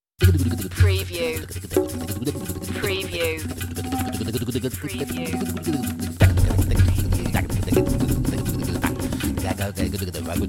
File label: ethno scat